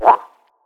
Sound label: PSILOTOAD idle